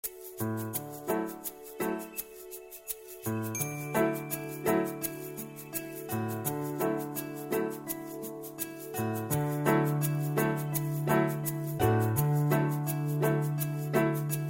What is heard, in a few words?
Audition Accomp